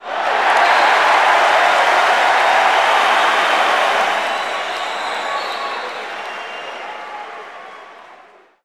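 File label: Basketball Crowd Large Cheer; Crowds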